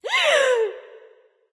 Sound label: gasp; smite; aphrodite